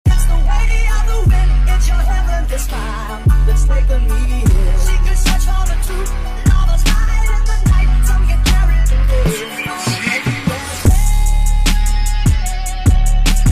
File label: Instrumental